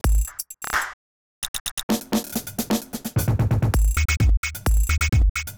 drum; Pony Fan Music Remix Stems

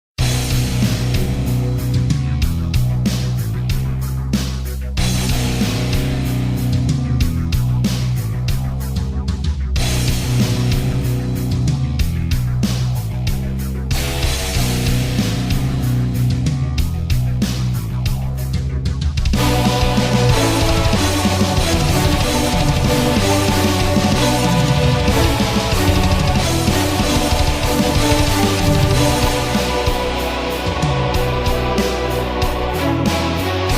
Instrumental Symphonic Metal Cover; EQG Rainbow Rocks

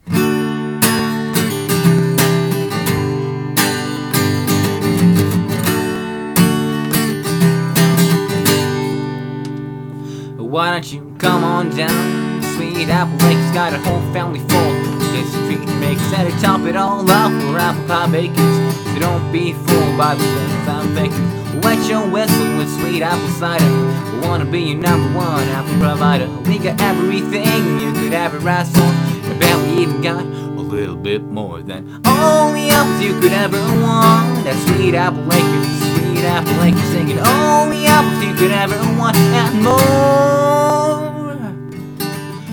(Acoustic Cover)

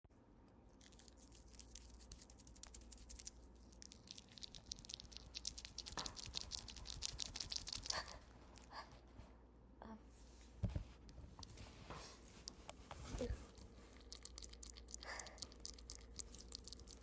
F4A noisy wet noises; wet doggy; really wet; barking; wet noises from a wet pervert

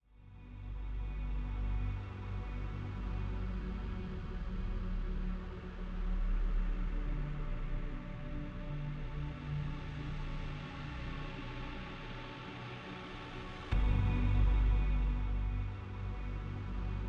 backing pads; 140BPM

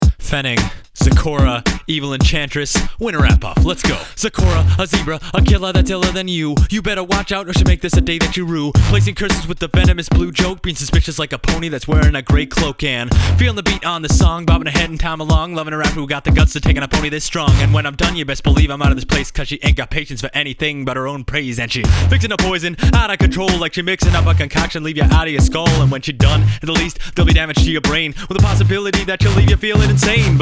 Rap; Winter Rap Off

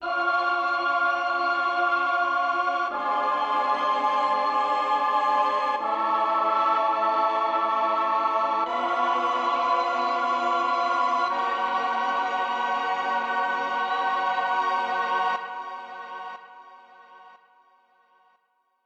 mellotron practice

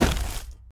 Monster; Zenless Zone Zero

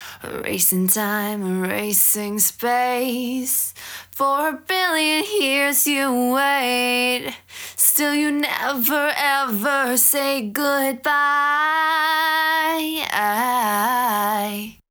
build, 128BPM; Stems

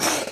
Gag; Lewd SFX

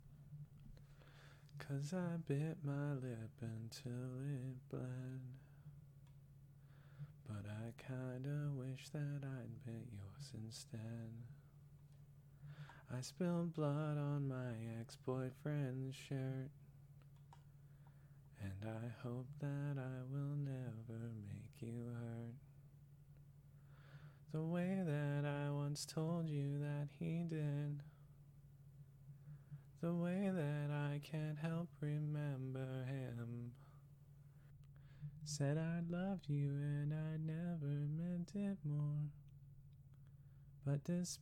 Singing, No background music

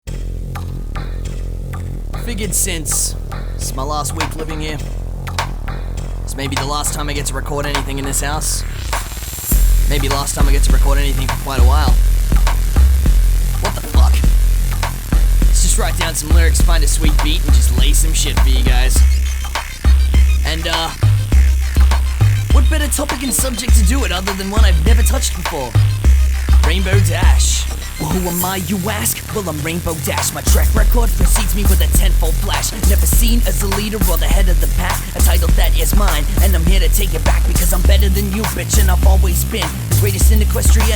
Rap

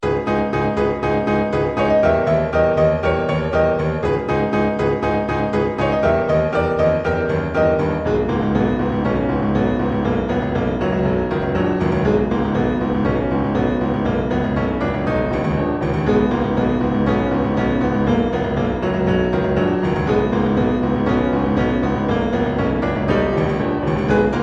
boss theme piano cover; sonic 2

